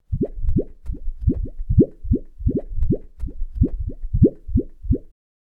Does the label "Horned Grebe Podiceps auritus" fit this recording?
No